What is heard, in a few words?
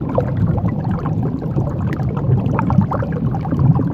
deep water